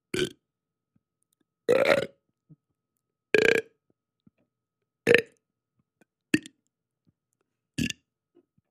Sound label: Six Really Nice Lit, burping